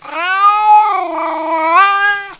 cat idle

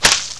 body falling

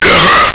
echo

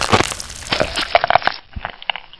bite victim, Barnacle; Half-Life

